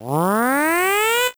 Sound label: Flag Down; SMA4